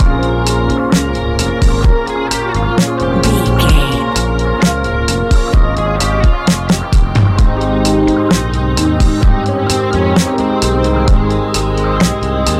sultry silence, A relaxed and chilling piece of music; A relaxed and chilling piece of music, great for light documentaries, background music for tv shows, lift